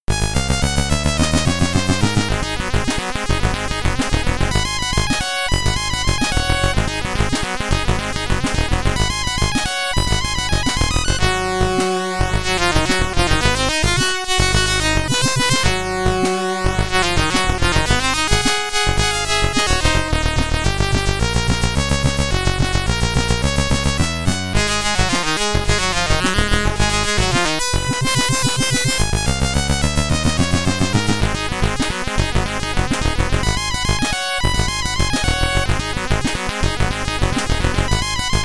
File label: Stage BGM, SID Stereo; Sewer 1; Teenage Mutant Ninja Turtles; Commodore 64